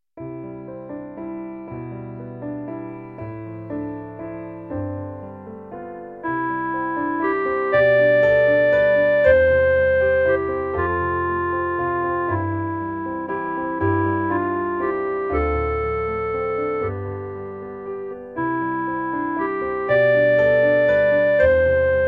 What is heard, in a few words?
CLARINET; musica I ESO 2015